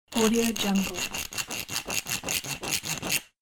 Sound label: plastic scrubbing rough; domestic sounds